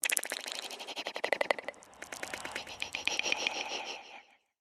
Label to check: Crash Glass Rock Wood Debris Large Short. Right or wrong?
Wrong